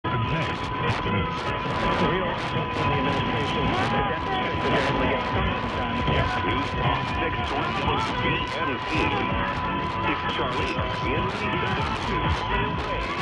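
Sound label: Burlington VT, radio; WVMT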